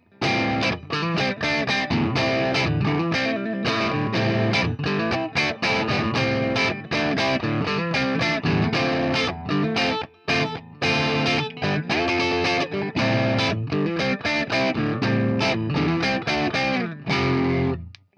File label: scrunch funk; fender classic player